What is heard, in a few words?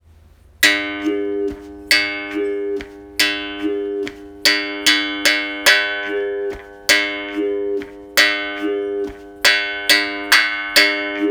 strong berimbau wah-wah; Capoeira Music Practice Material